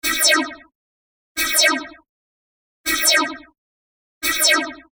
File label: laser